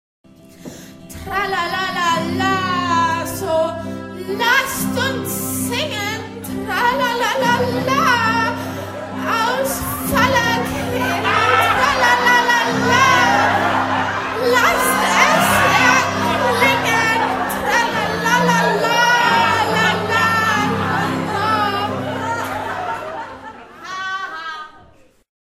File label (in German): tralalalala